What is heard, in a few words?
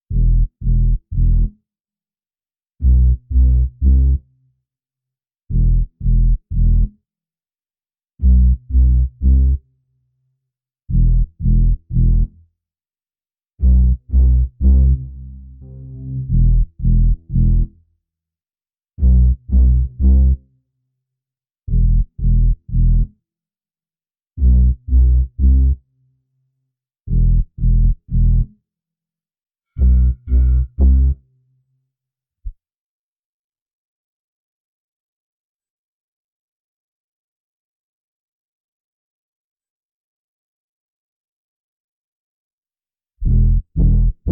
Bass